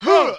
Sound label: rap huuh